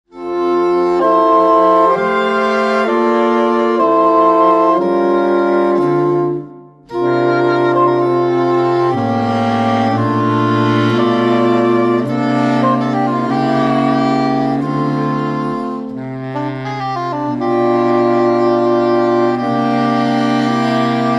sax

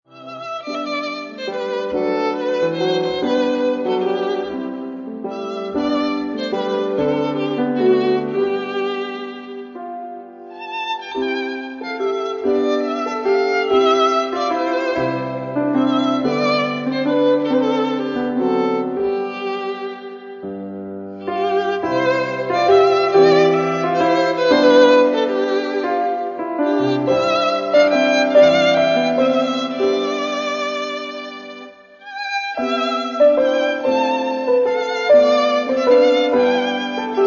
music relaxation

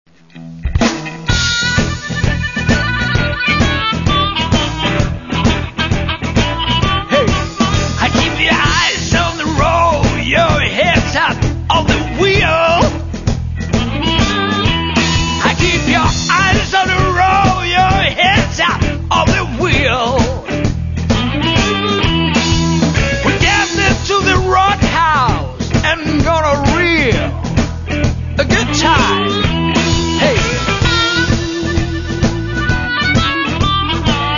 save the blues

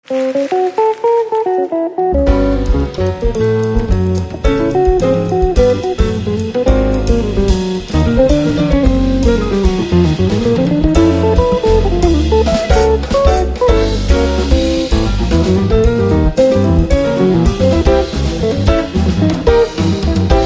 guitar live

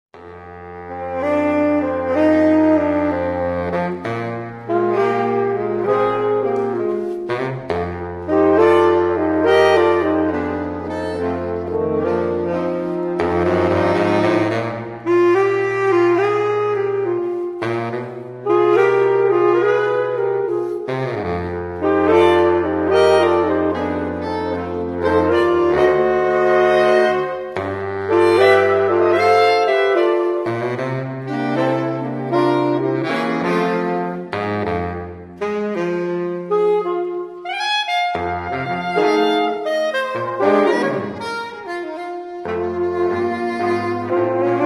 sax classics jazz

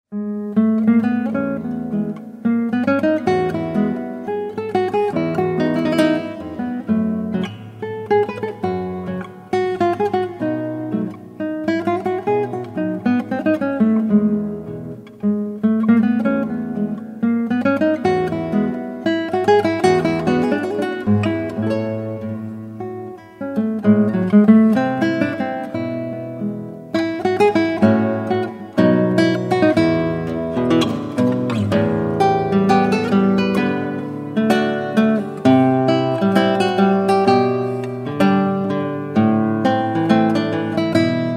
Romantic Guitar

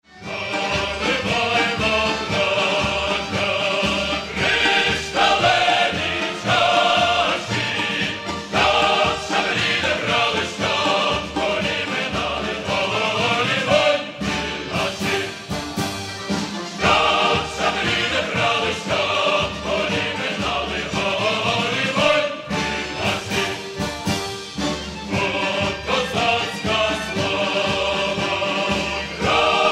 Folk Choir; Volyn

